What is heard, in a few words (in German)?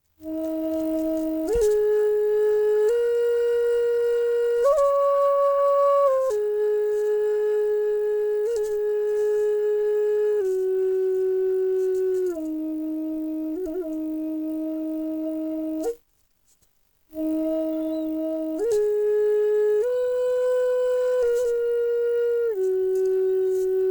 E 432 zeder ohne hall, drone flute indianerfloete doppelfloete; aromatic cedar